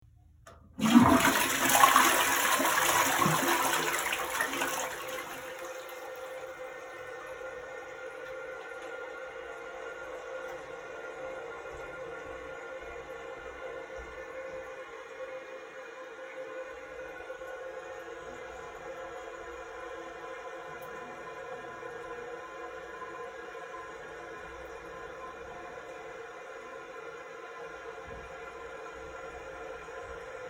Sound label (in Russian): sliv unitaza napoln vody bachok